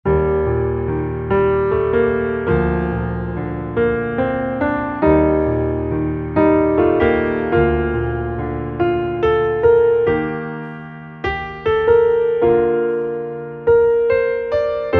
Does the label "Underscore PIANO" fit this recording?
Yes